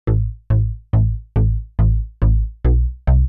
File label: TECHNO BASS 140 A